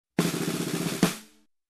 Tension Build Up Roll

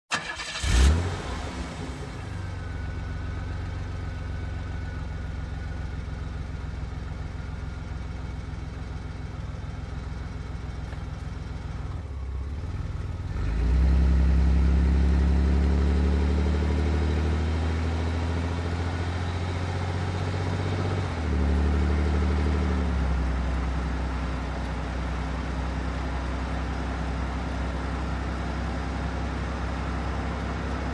Car Engine ignition and driving steadily

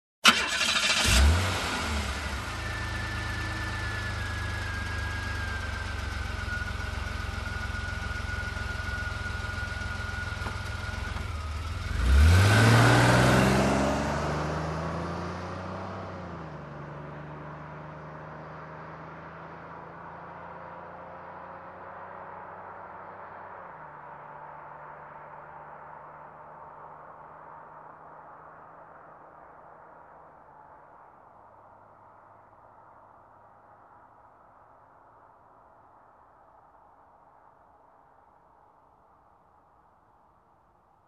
Car engine ignition and driving away

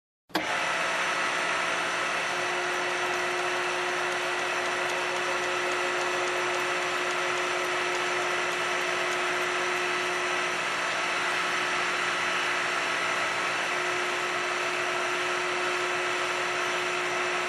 Construction

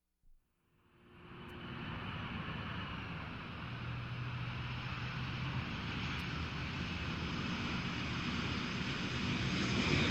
Aircraft Nearing Whirring

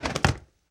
Body fall and collapse

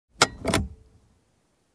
Loosening Seatbelt

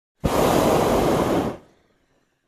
Pumping air sound

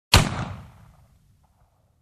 Close pistol shot sound

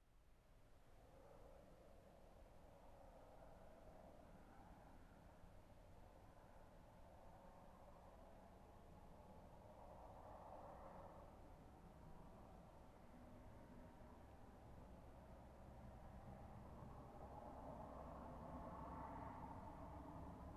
Car approaching and parking

